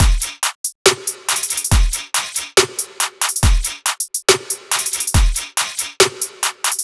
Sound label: Hip Hop Drum Collection Full Kit 140; Contour